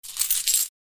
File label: Handling coins quarters